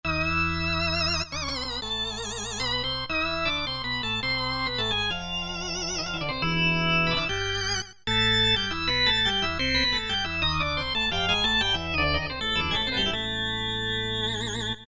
Lead, Korg MS2000; Factory Patches

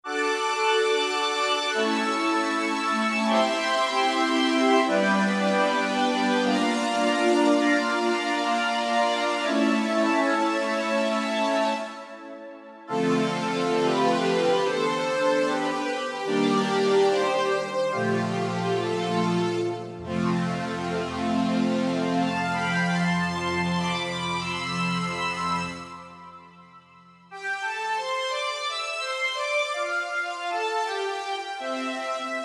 Strings Machine; Roland SC-880; Factory Patches

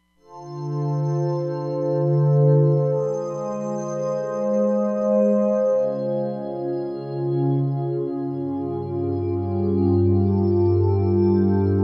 Glass Organ

